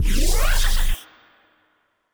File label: Spaceship FX; Cymatics; Video Game Sample Pack Vol 1